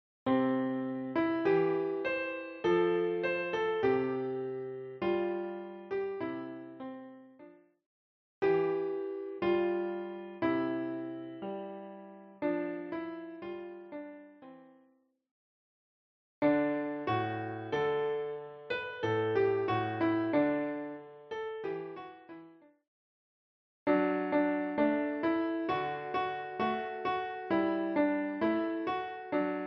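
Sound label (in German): Kinderlieder, piano; Kinderlieder Vol.II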